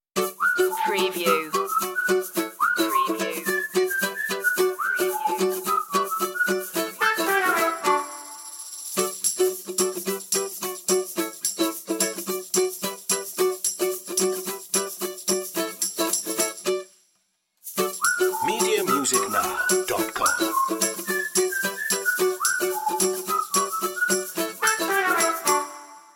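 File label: whistles ukulele trumpet and percussion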